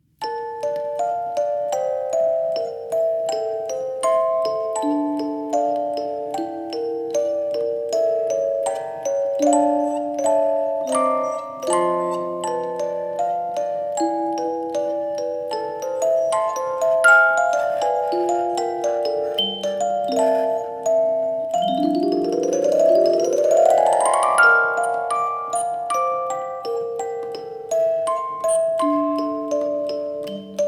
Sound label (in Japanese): オルゴール Ver.